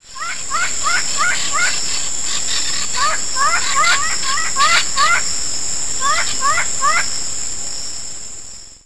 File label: Nature Yellow-crowned Amazon Parrot